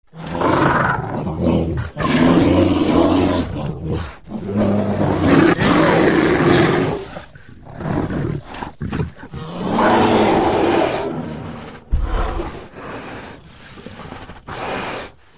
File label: bear; cstrike